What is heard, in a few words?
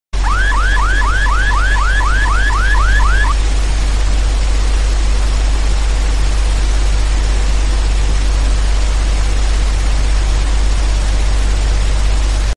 Ballistic missile; موشک بالستیک